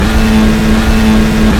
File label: in CMAX; France; AOS F1 2022